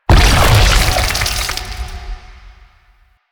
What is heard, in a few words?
portal spawn; ambience; Fibonacci; Warframe; Entrati